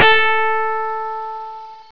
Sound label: piano